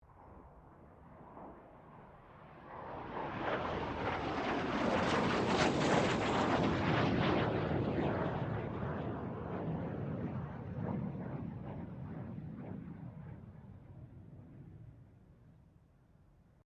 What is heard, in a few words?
FA-18 Hornet pass; RAAF FA-18 Hornet; Airplanes